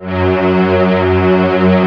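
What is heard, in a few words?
symphony strings F#3; Back In Time Records; Korg Universe VOL-1; P3+SYMPHONY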